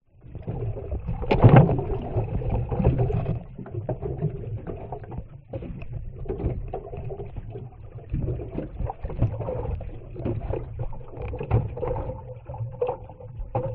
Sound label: boat movement floating light waves underwater recording; transport